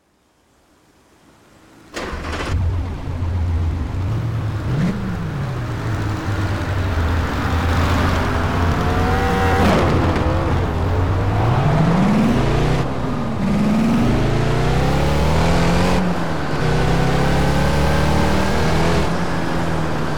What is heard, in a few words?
chevrolet camaro z28